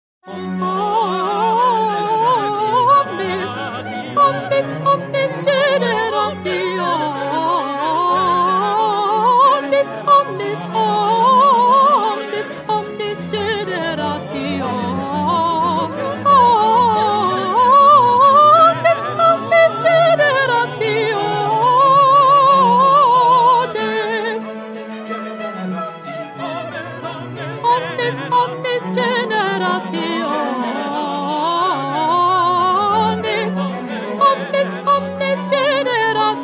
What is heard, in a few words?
Soprano; Bach; Magnificat